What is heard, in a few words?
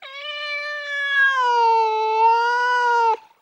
meow synth